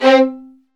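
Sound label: Violins Marcato; Roland LCDP13 String Sections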